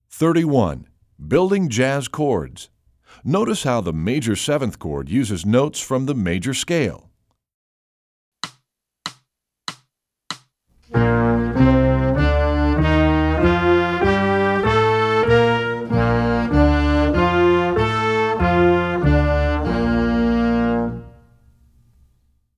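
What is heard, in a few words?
building jazz chords g major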